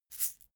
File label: shaker plastic egg hit double soft